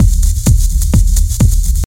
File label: delayed verby groove techno kick; drum loops